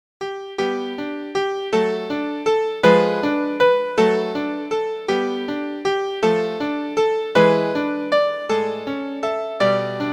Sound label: CHIRP